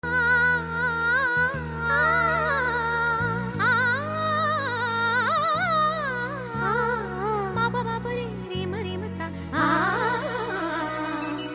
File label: BGM Humming Ringtone